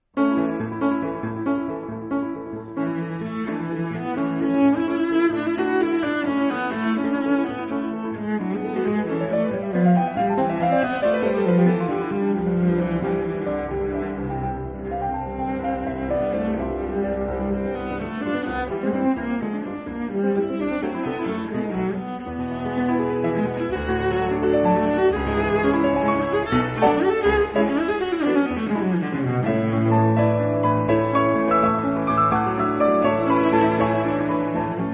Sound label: Andante Allegro Cello piano